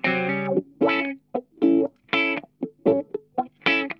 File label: CROSSING, Funk Guitar; Zero G - Funk Guitar